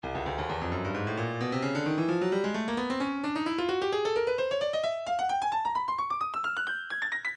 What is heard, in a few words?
Percussion Bongos, midi; Stylus